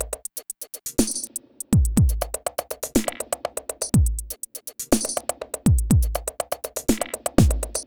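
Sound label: Tweak Drum Loop 122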